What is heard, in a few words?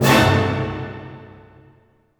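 C diminished fortissimo, orchestra hits; Sound On Sound - Peter Siedleczek's Orchestra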